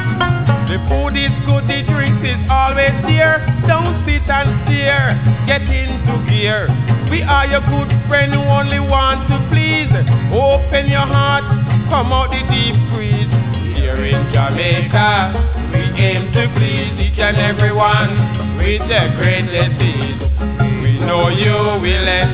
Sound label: humming